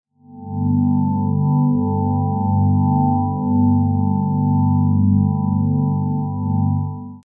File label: drone india; drone archeology